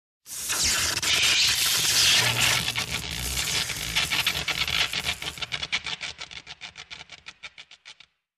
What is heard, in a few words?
Long Electric Discharge